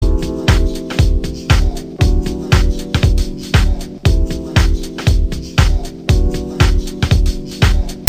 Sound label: zouk